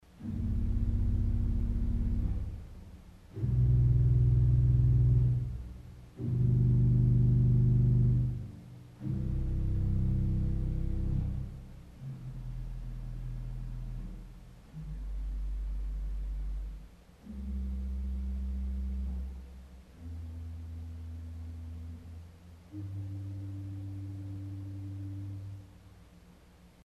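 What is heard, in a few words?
De Profundis Arpeggio Alone, organ stop; CulverAcademy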